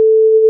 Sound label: tremolo